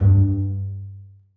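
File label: basses pizzicato f#3; Sonatina Symphonic Orchestra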